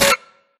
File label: Screen Glitch Short; Boomtown; Titanfall 2